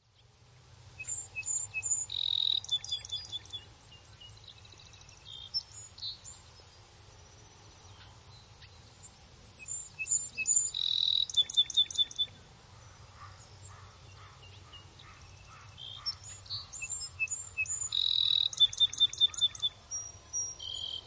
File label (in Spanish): ruta en cultivos; el colmillo de cobre